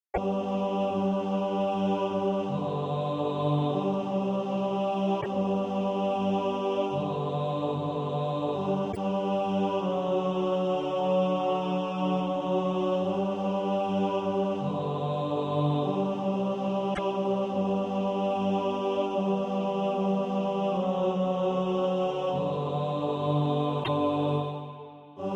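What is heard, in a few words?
tenor; Lord Im coming home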